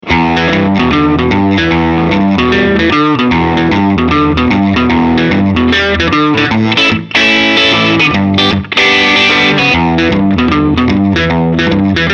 AC Hi Boost; ElevenRack; 66

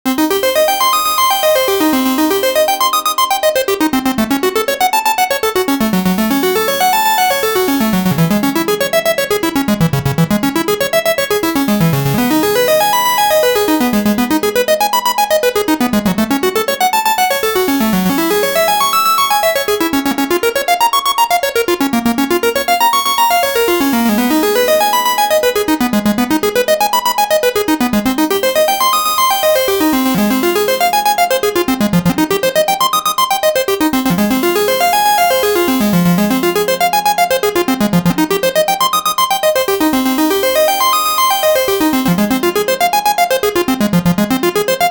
Cascade 8 Steps C

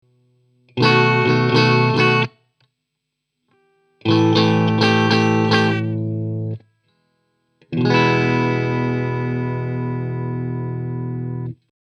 Guitar; grace design felix

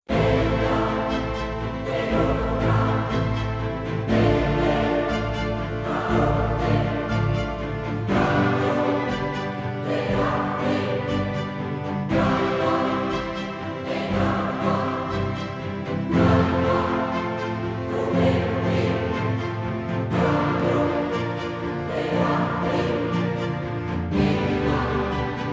Orchestra; TO Animated Orchestra; Animated Pandemoniums Garden

